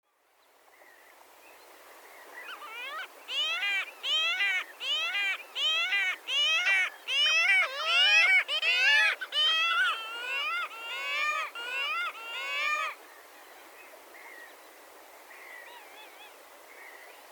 korotkohvostyy pomornik golos

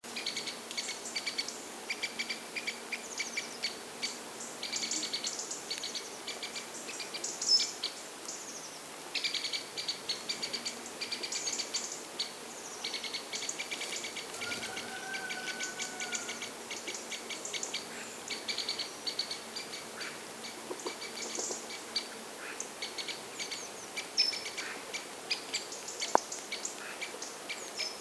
sredniy dyatel golos

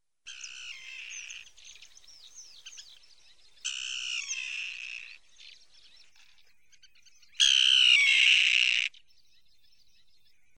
rechnaya krachka golos

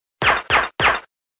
zvuki strelby

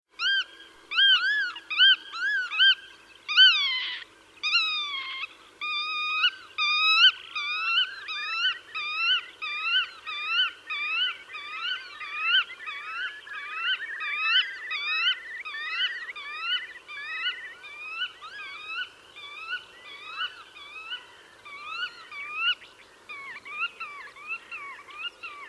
bolshoy veretennik golos

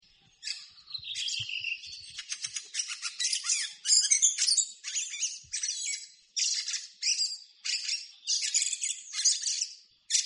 ryabinnik golos

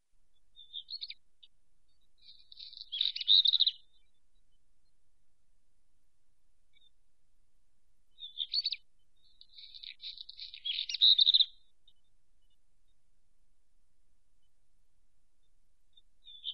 gorihvostka chernushka golos

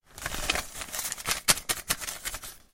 zvuki deneg

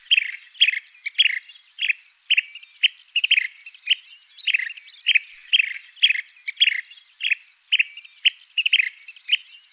morskoy zuek golos

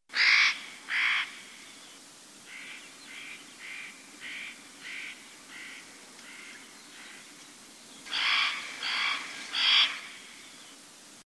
kedrovka golos

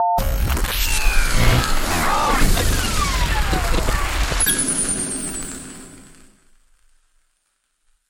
zvuk televizora

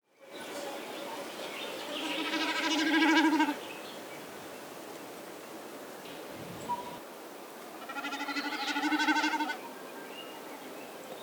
bekas golos

